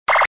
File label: laser; serato